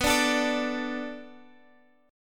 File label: B Minor 7th B, Guitar; strummed; 4E,4A,5D,5G,5C,6F; 19,17,19,19,x,x